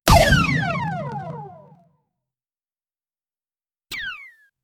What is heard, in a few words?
cartoon gun shot light; air burst